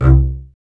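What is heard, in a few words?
string contrabass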